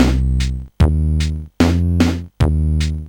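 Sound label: rhythm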